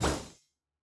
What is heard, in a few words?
throw ball cold; CrossCode; battle